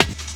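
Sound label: snare drum, Drum & Bass, 175 BPM; Ueberschall; Drum & Bass Flash Trackz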